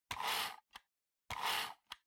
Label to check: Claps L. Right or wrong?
Wrong